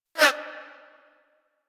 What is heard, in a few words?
Revolver Pistol Whizz